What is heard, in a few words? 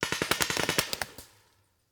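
Paintball Gun Impact; Sound Ideas; Guns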